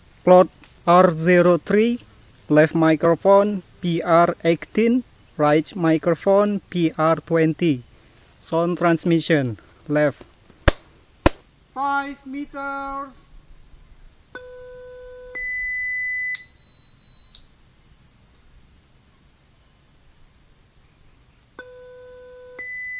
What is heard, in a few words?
birds